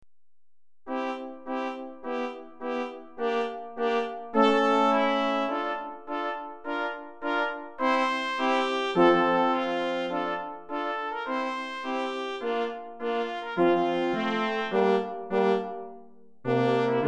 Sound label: Brass Quartet